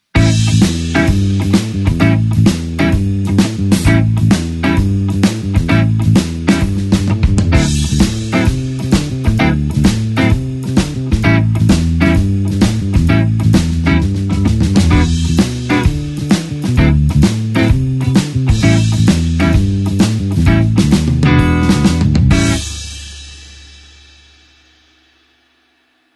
blues shuffle basic pattern A

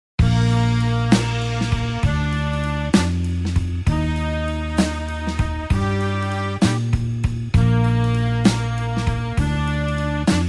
blues horns slow Bb

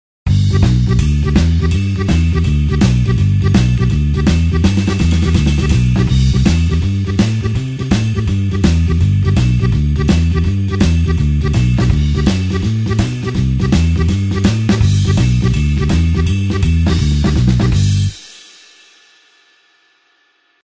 blues harp shuffle G

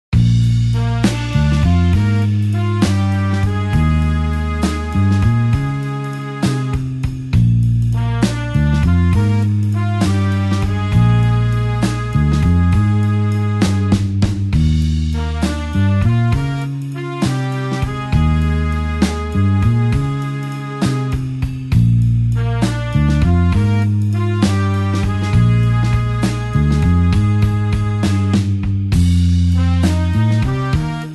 blues horns slow Bb

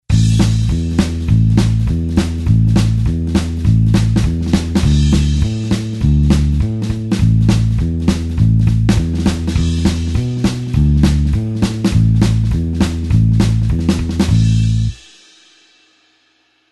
blues bassline 2beat A tempo

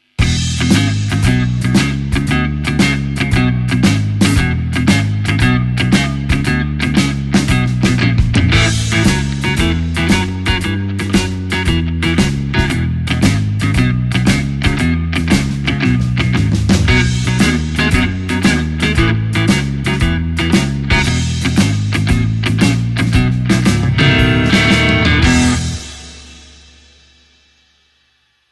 E shuffle powerchord cliche

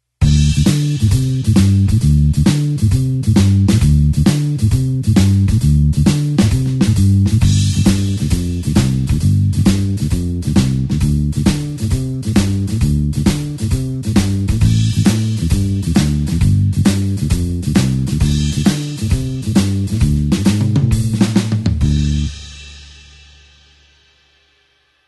blues bassline shuffle D

